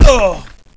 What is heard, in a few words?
player fall pain